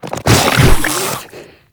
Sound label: Infested Ancient Strike Flesh; Warframe